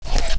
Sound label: draw, weapons; henry